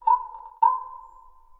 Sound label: bird coniferous raven